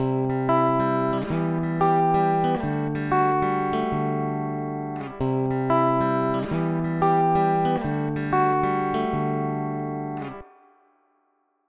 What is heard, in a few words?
reverb spring; guia de pedales de reverb